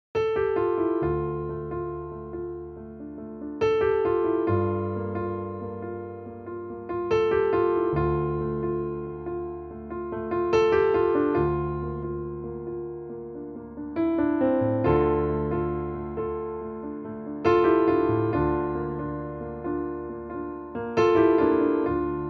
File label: Soothing Sounds for Body Mind Soul; Spirituality